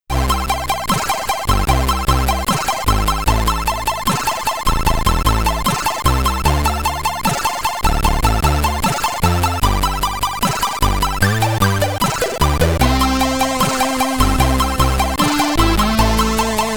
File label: Ending, SID Stereo, Commodore 64; Plis